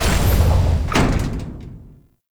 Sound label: Portal Entrance Door Close; Duviri Murmur; Octopede